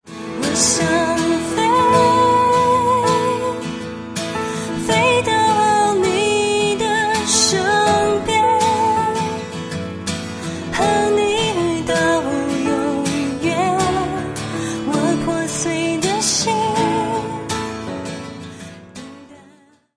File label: bonus song chinese